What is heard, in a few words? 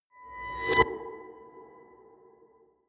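musical swell short reverse, drones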